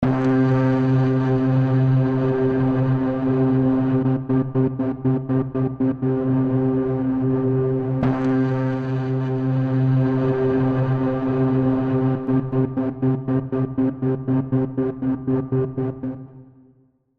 Scoring Bass Pads Complex FX

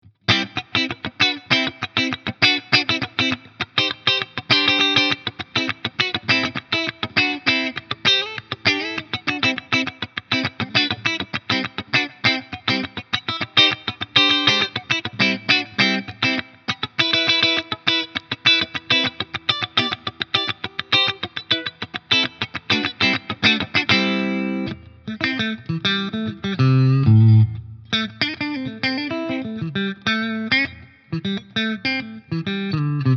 Mitte clean funky; GL Tribute Doheny Shell Pink

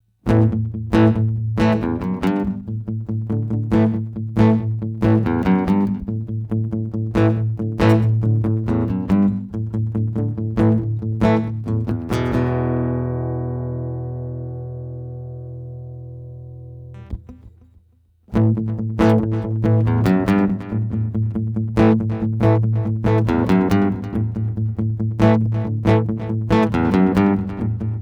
Echo

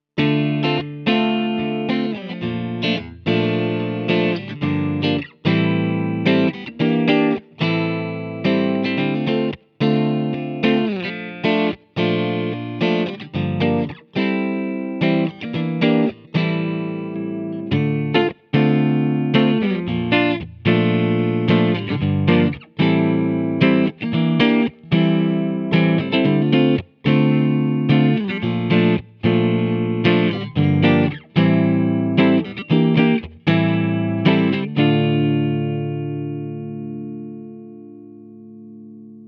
Clean

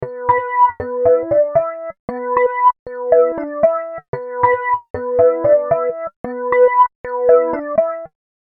Flanger

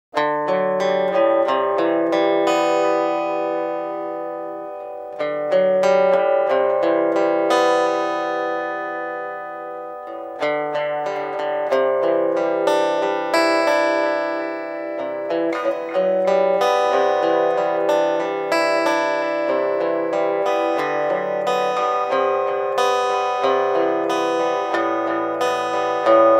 Smallbody Delay

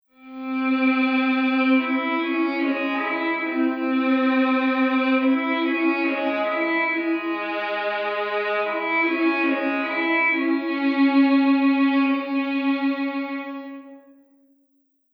Violin

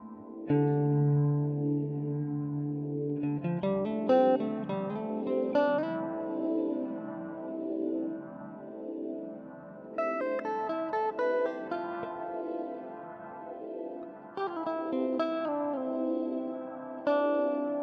Meris Polymoon Synched Phaser

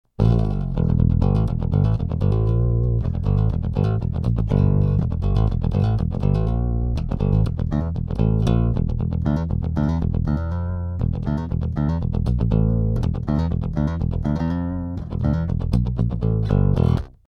Pick; EBS DI Bogart

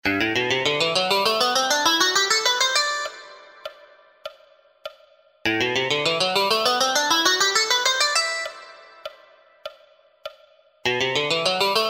Scales 3 Notes Per String

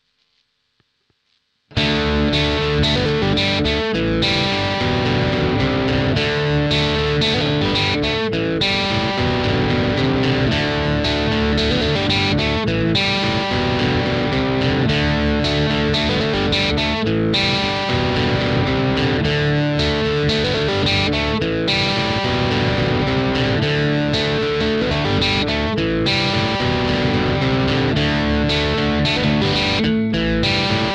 GA-3 mid gain

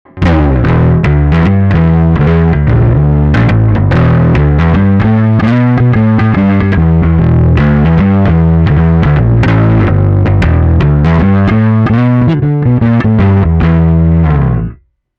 Omega overdrive only

